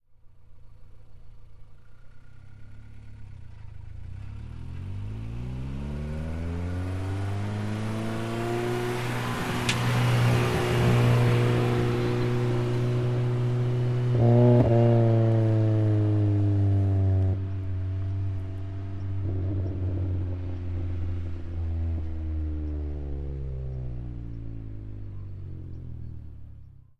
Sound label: Integra, slow but high rpm approach, pass by, upshift, Acura Integra